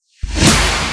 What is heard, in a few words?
Snare